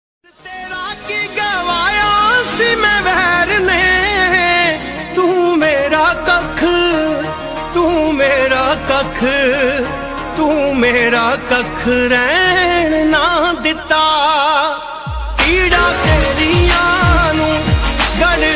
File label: punjabi sad ringtone